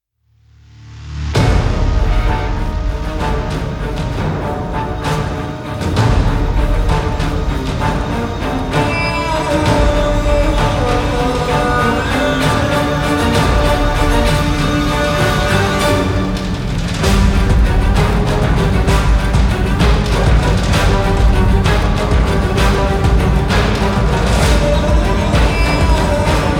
ACTION ORCHESTRAL; Wicked-Claw

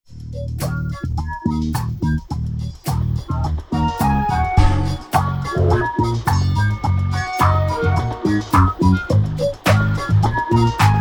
stereo MUSIC only

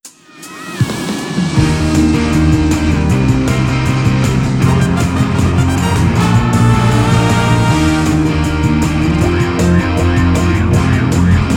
stereo MUSIC only; FLY

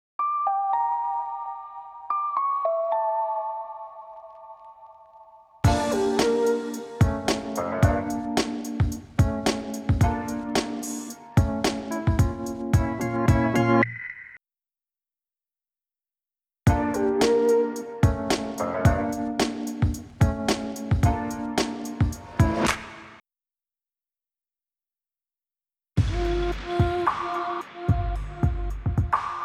music; OPPO; Flower; 长版本; 分轨; commercial

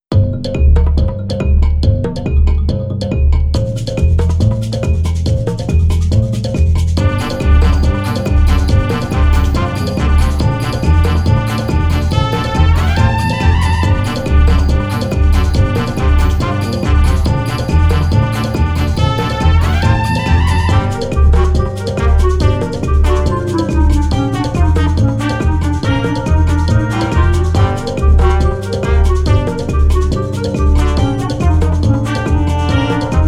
stereo MUSIC only

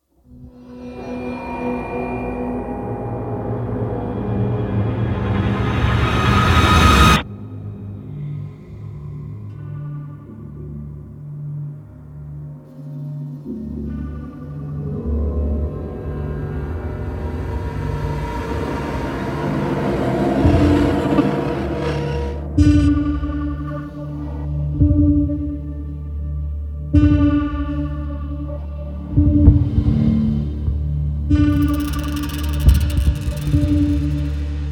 Group Hunting Suspense; MAIN-THEME

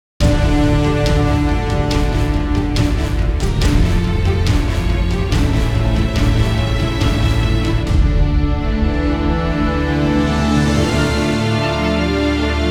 STEM MUSIC Stereo; BUICK; TVC

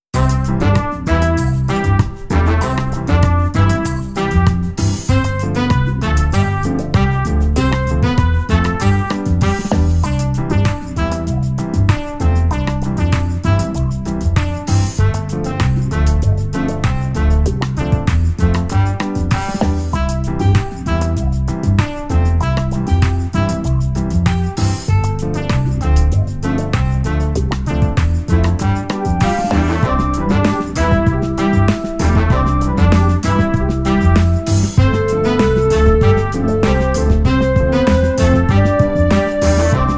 stereo MUSIC only

